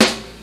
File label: FX SNARE; E-MU Producer Series Vol. 2; More Studio Essentials; Composer; Technopourri